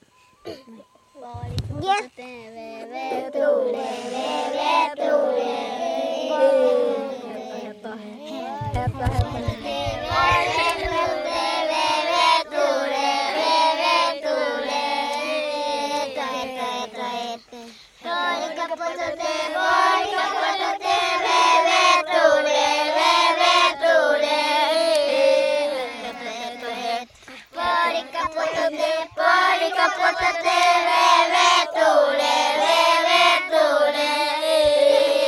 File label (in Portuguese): timbiras